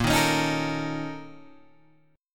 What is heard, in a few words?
Bb Minor Major 7th Double Flat 5th, Guitar, Open G